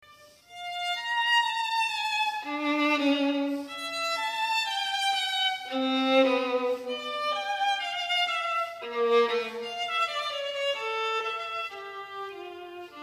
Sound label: violin